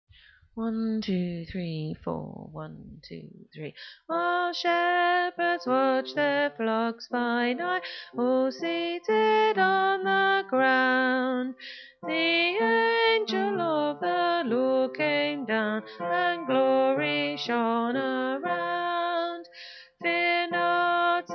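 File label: Bass While Shepherd Watched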